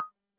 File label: mic off